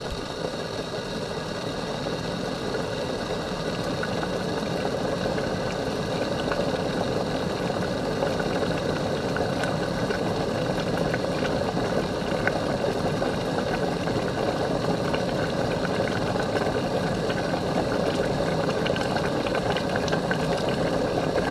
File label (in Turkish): kettle yogun sekilde kaynayan su sesi